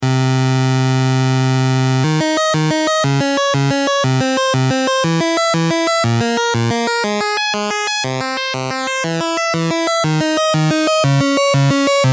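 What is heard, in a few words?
paper synth square wave pwm expfm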